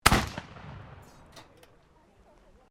Musket Caplock Firing, Black Powder firearms at a shooting range; Gun Shots; Zoli